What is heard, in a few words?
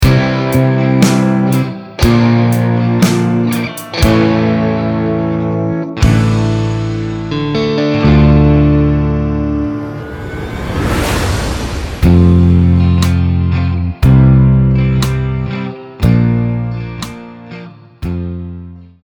break